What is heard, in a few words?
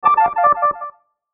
Logo Light Musical Flutter; Blastwave